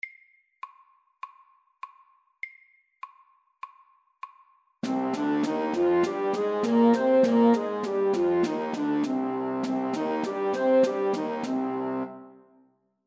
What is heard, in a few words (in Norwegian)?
C moll natura FORT